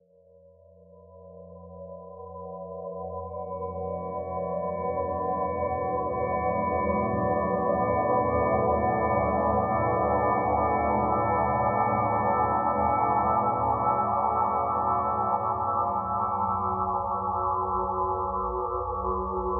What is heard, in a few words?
Badinerie with Spectral Gate & Hold; SOUNDMAGIC SPECTRAL; Michael Norris